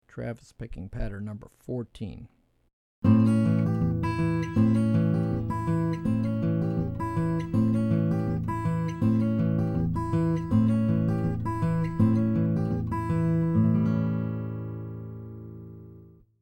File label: Travis Patterns; travis style lesson materials